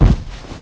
icewind die

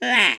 fur seal damage

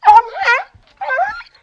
galion die

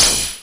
attack; bathory

